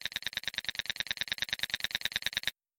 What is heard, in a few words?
timer